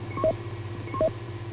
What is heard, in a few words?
pings twice